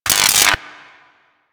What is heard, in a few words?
Ocean Electric Surge Small; Warframe; Grineer Ocean